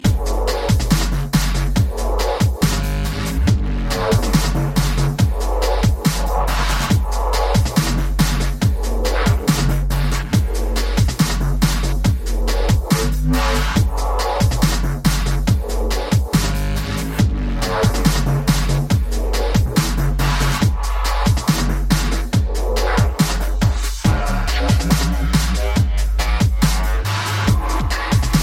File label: DUBSTEP